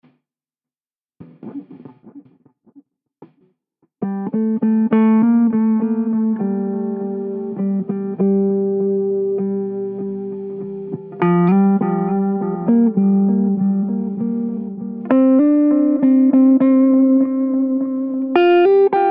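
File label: Gm 100 guitar impro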